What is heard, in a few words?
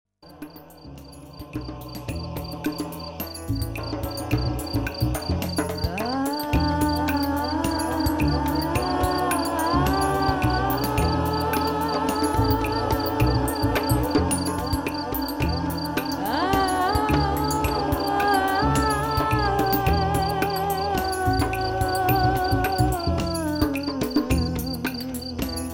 cello chill sounds